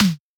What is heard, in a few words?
Snare